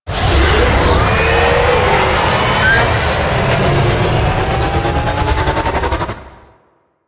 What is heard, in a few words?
Crp Ship Fighter Thruster Charge; Ambience; Props; Warframe; Corpus Ship Remaster